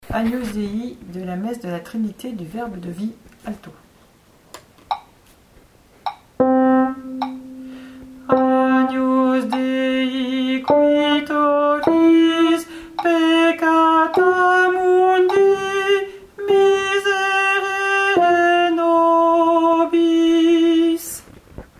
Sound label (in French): ALTO; Messe de la trinité du Vde V AGNUS